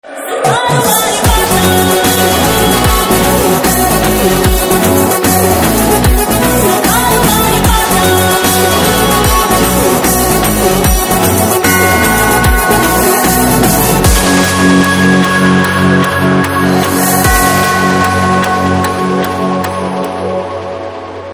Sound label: BGM Music; Sarkari Vari Paata Motion Poster